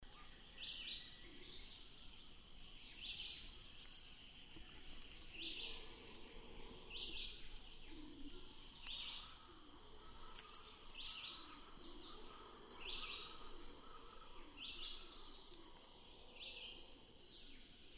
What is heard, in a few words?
Dendroica striata, song and flight calls; S. America; Eastern Lowlands